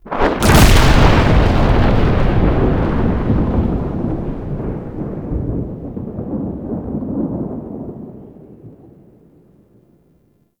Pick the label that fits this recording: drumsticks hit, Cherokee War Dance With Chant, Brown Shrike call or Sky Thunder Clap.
Sky Thunder Clap